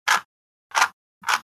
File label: Rubiks cube rotating solving